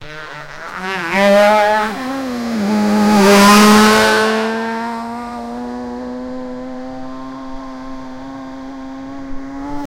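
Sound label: jaguar and mustang high speed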